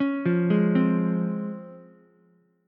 C sharp Major 7th C sharp Ukulele; C#M7 arpeggiated; JGuitar

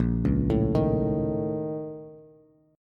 Bb Minor Major 11th Bb, Bass, Bass Standard; BbmM11; arpeggiated; JGuitar

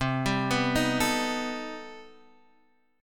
C Minor Major 13th C down

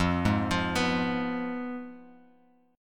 F Diminished Guitar Open F; Fdim arpeggiated; JGuitar

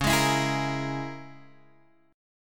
Db Minor 6th Db down Guitar Open G

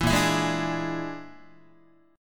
A sharp 7th Flat 5th, Guitar chord; strummed; 7,6,7,6,6,7; JGuitar

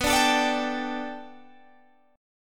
B Minor 7th Sharp 5th B Guitar Standard, chord; Bm7#5; strummed; 19,17,19,19,20,17; JGuitar

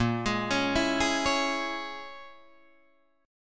A sharp Minor 7th Flat 5th A sharp, Guitar Drop D

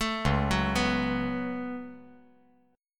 Db 7th Suspended 4th Sharp 5th Db down; JGuitar

